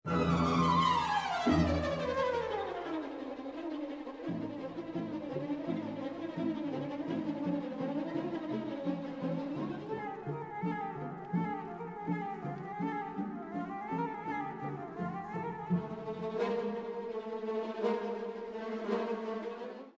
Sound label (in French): bourdon; rimsky